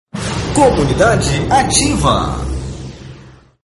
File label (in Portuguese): vinheta